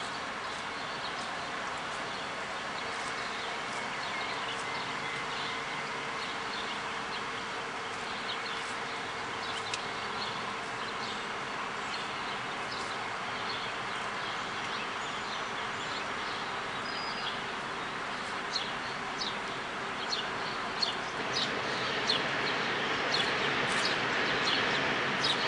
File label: Brown Thrasher Lot